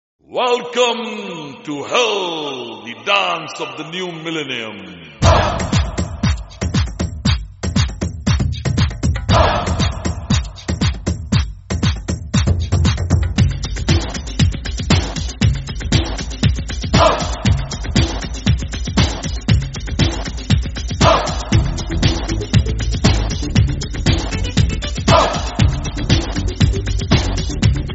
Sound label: Dance Music Instrumental; ajnabee 2001